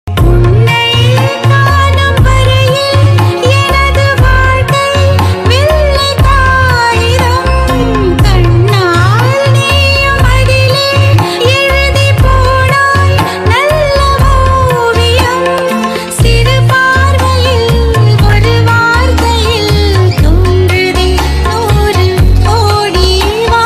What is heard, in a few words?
song tamil love feeling melody